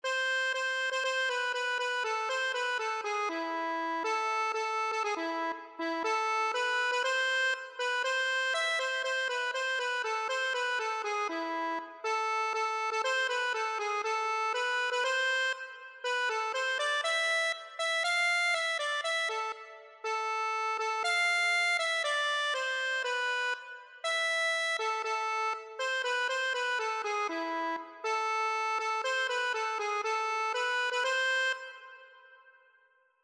eveleen, accordion

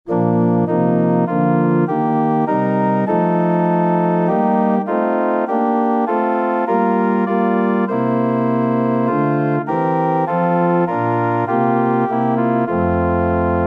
when morning gilds, organ

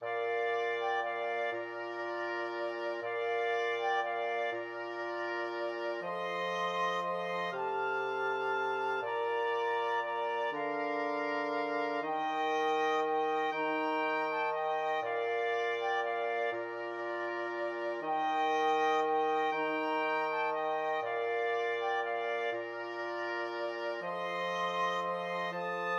flexible mixed ensemble, 4 players

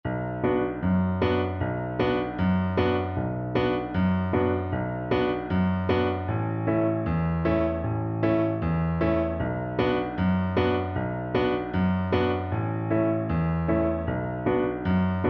drunken sailor, Double Bass

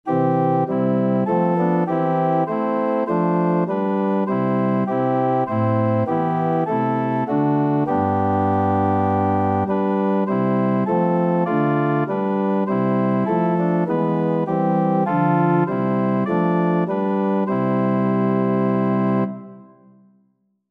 o god our help, organ

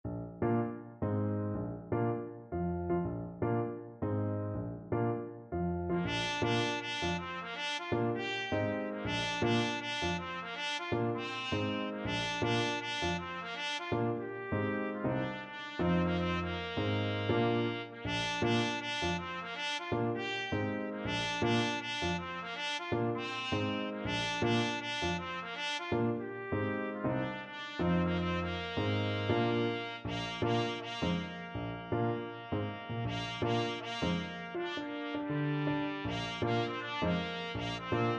trumpet

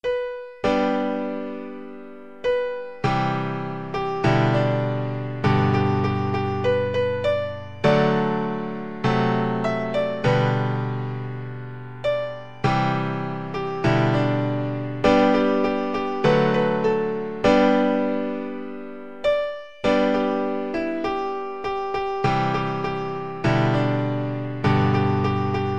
swing low, piano

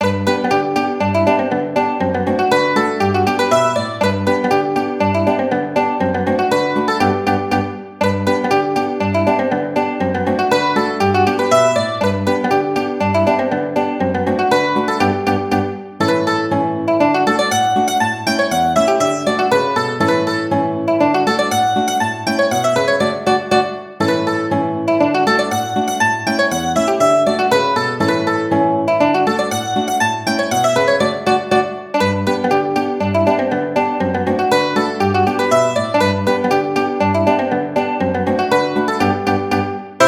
guitar mandolin, mandolin-guitar duet

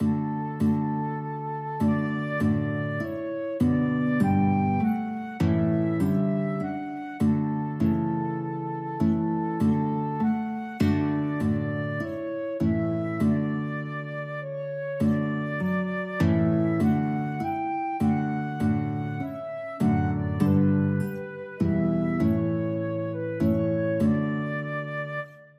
FL GUIT duet, guitar-flute duet; wiltshire

